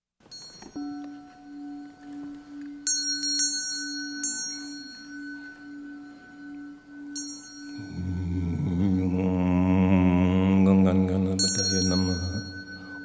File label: Sound Meditation